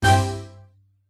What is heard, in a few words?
Battle Strike